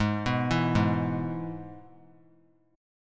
Gsharp Minor Sharp 5th; arpeggiated; 20,18,19,18; 3C,3F,3A,3D

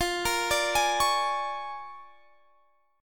A sharp 7th Sharp 9th

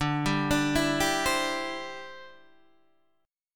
D 9th Suspended 4th D down Guitar Open D

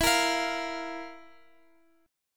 F Major 7th Suspended 4th E

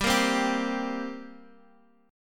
G Minor Double Flat 5th, G, Guitar, Open D

